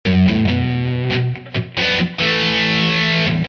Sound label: Bm 140 rhythm